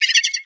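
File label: creature flewt attack heavy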